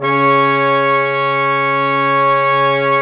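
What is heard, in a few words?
BRS QUINT, brass quintet; Roland L-CDX-03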